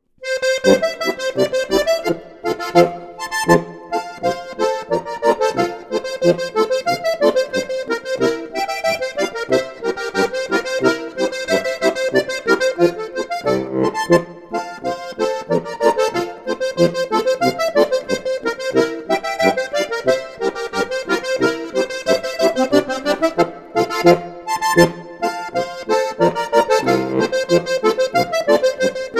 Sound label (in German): Zillertaler Poltra Boarischer